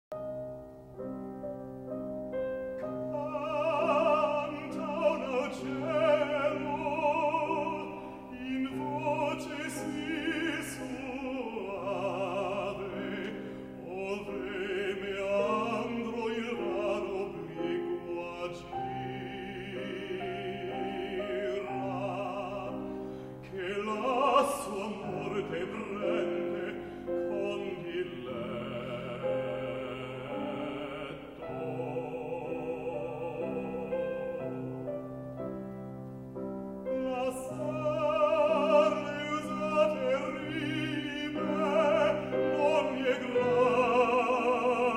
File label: me singing Animal Recital